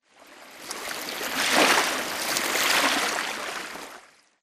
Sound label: swampland water lapping; Titanfall 2